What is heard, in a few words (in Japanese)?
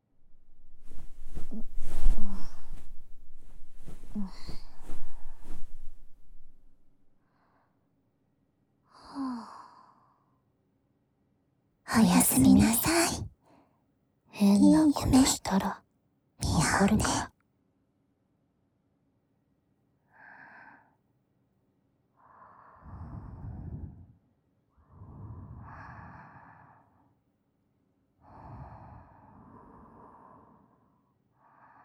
おやすみ寝息（添い寝）